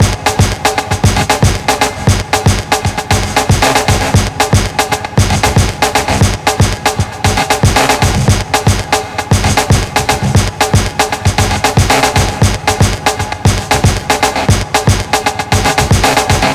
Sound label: loop